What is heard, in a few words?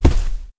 Rug Walk; moul